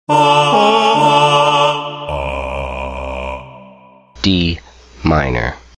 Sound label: D minor Voice; key note recognition voice ear training